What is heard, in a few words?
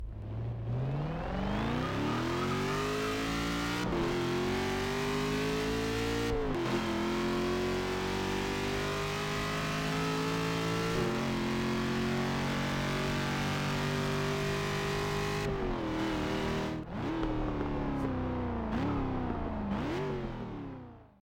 Rock